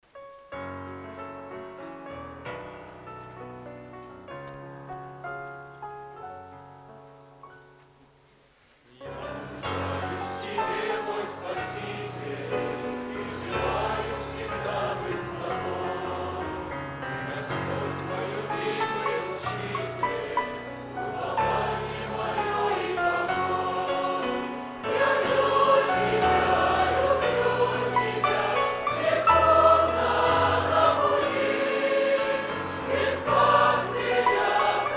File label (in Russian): общее пение; Молодежное общение г. Апшеронск